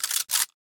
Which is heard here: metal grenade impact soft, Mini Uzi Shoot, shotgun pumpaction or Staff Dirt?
shotgun pumpaction